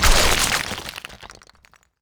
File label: debris stone stereo